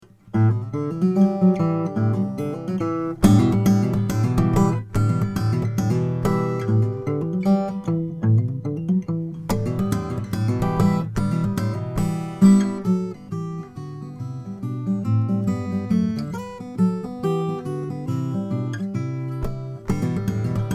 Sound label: olson sj cutaway indian rosewood